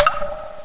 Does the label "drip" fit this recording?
Yes